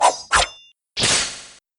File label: slash to sheathe